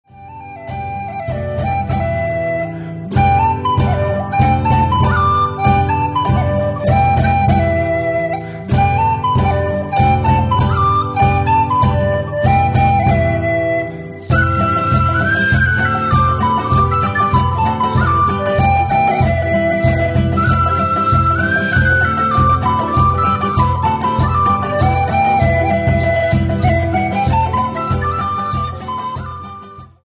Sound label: Peso Whistle